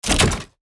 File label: Shooter fps game menu ui tab switch